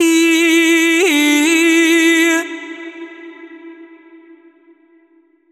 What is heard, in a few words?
vocal loop eh fall and rise wet, Emin, 174